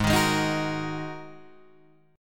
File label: G sharp 7th Suspended 2nd G sharp Guitar DADGAD